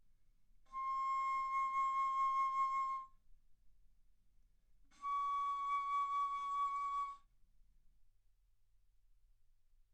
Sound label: Bass Flute C6 Db6; ff